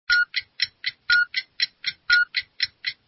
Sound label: time up alarm; mahjong ningxia